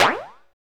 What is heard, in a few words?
Bounce Up; FREE SFX PACK VOL 1